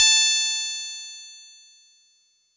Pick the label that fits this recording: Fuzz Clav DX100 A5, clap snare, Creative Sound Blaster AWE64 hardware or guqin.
Fuzz Clav DX100 A5